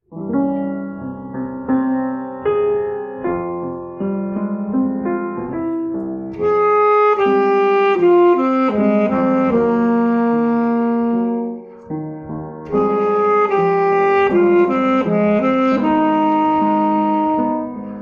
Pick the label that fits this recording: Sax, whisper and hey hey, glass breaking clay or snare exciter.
Sax